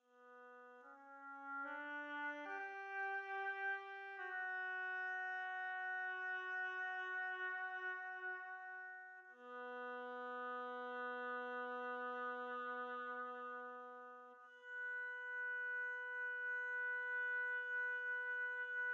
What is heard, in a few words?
Oboe